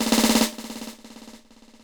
LOOP END, Drum & Bass, 130 BPM; Ueberschall; Drum & Bass Resonance

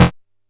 F2, Bass; E-MU Producer Series Vol. 6 – Analogia Project; Boom da Bass

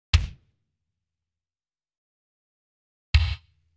bass drum dry + wet; Sound Sampling Collection VOL-5; Drums & Bass Fusion Funk Effect

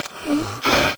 Ashma Weeze, Percussion Hits; Drum Hits; Nu Dance Electro; Zero-G; Soundclash; Acidized Wavs

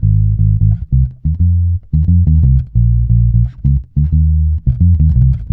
G#, dub, bass; Spectrasonics; Bass Legends CD 1; JOY DUB

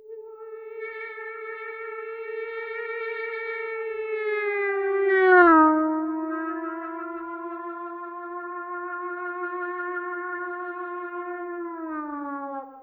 guitar, C guitar

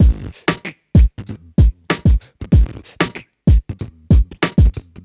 Street Tip, R&B Drums

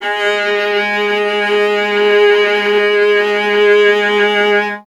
viola G#; Miroslav Vitous; Solo Instruments VOL-1

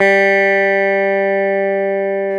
GTR, Clean; Club-50 - Foundations Roland

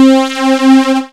HARSH POLY, SYNTH FUNK; Zero-G; Funky Elements

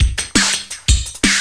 loop, 170 BPM, drum & bass

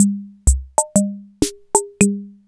arranger

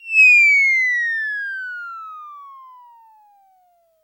WHISTLE, CARTOON

